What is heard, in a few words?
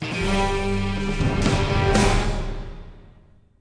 Sting Ui Boss Intro